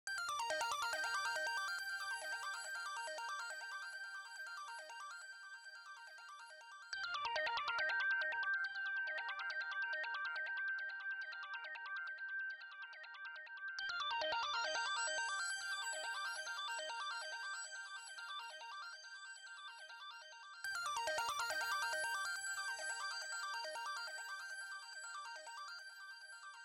140 BPM G Min Synth Echo; Cymatics; Destiny Gold Expansion; Infinity

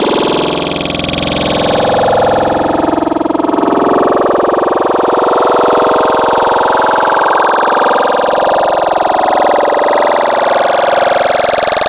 Female Throwup; Wavetables; Cymatics; Vomit for Serum Vol 2

